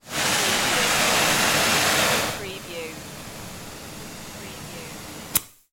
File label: Blow Torch Flame Run; sound-effects-bible